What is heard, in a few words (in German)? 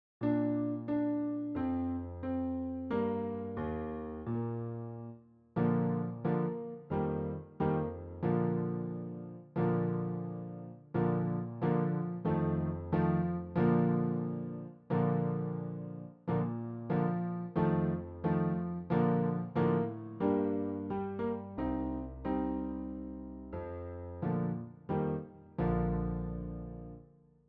Lieder Trompete; Regenlied